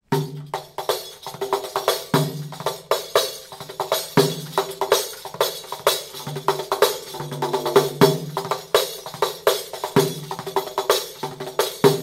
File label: Riqq